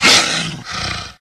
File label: pdog attack, pseudodog; russian; sven; russian sounds